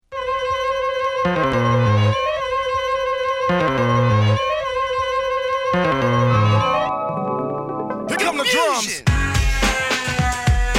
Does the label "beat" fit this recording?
Yes